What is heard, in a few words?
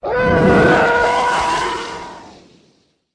The Flyby